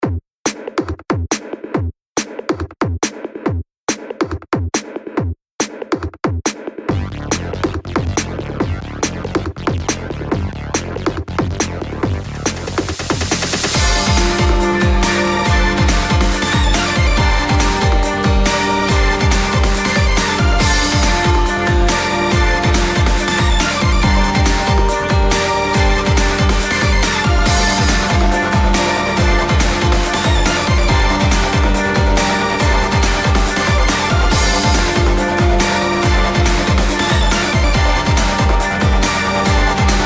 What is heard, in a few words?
cyber; maoudamashii